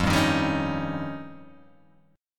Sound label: F 7th Suspended 2nd Sharp 5th